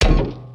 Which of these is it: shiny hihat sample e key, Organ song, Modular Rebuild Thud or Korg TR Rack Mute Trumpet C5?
Modular Rebuild Thud